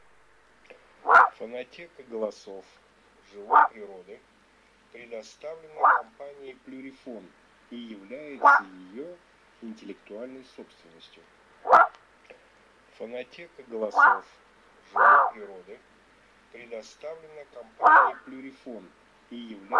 Лисовин Volpe maschio

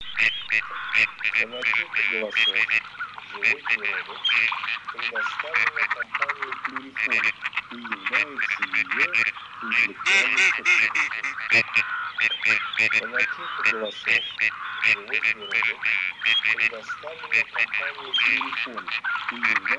Серая утка Canapiglia; plurifon

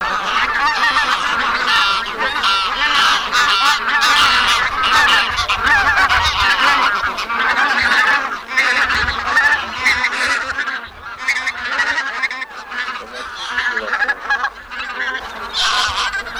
Гуменник базар Oche Granaiolle

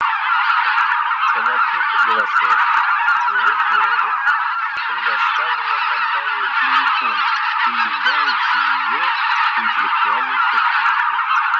Белолобый базар Oche Lombardelle

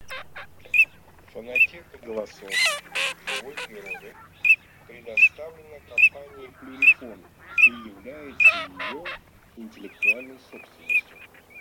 Чирок-свистунок Alzavola; plurifon

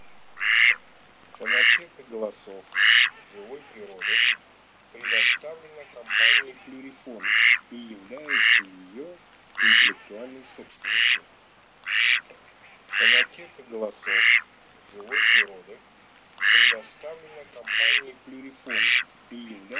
Кряква Germano; plurifon

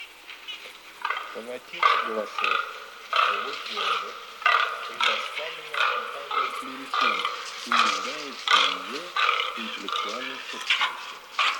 Лань самец Daino

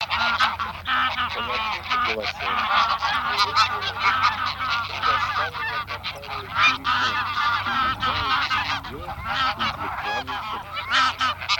Гусь серый базар Oche Selvatiche; plurifon

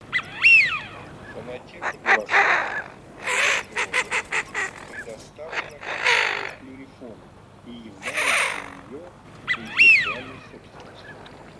Свиязь Fischione; plurifon

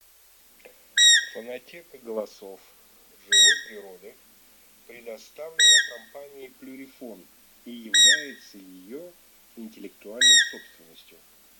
Косуля козленок Capriolo capretta

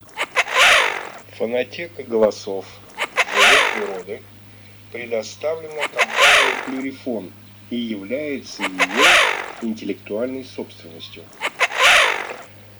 Свиязь Fischione; plurifon

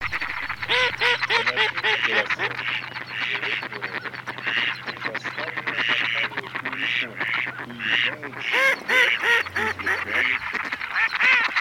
Кряква Germano; plurifon

